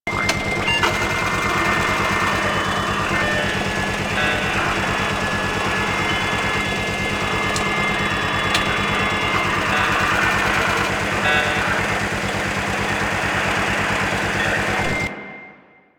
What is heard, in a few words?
Burner Tower On Loop; Excavation; Warframe; Nineteeen Ninety Nine; PvPvE